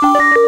KEY Hold Start

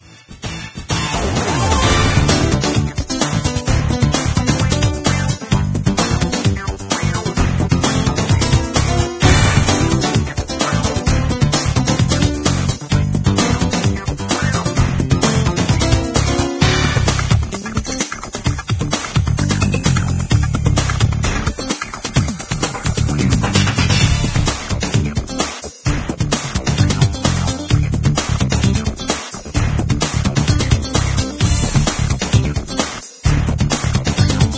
Menu Music; tiger woods pga tour golf ps1 gamerip 2000

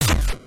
Retro; Bit.Trip Presents... Runner2 Future Legend of Rhythm Alien

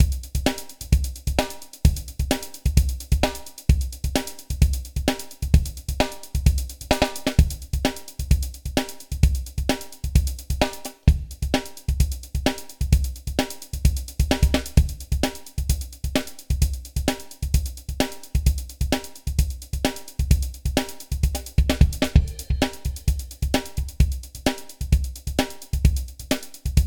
Cemetery Loop Drum; BouncyBoi in Puzzle Land

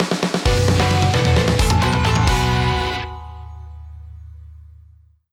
Win Jingle; Event Battle - Dark Bones

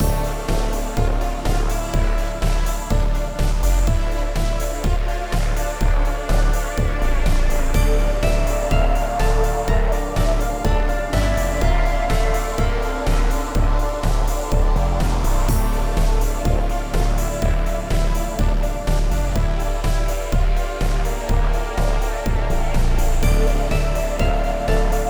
Dialogue Music; Aeon Must Die; Stage1

